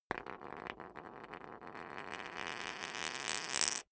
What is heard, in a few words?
poker chip spin long; soundpool